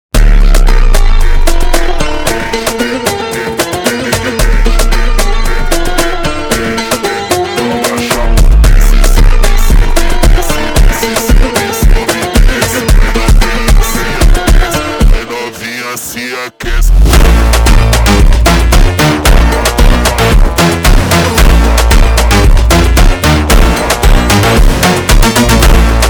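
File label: sped Up, phonk music; funk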